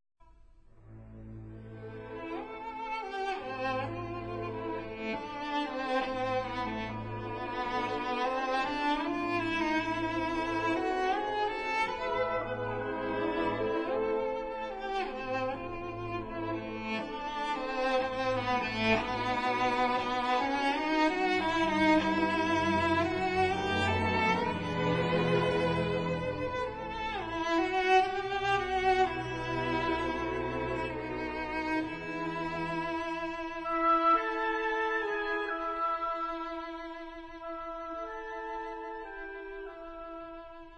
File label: cello; western